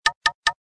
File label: Knock; GD510 Pop